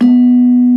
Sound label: SLENTEM, Bronze Gamelan; E-MU Formula 4000 Series Vol. 4; Earth Tones